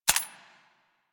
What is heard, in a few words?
Rifle Mech C; Sundial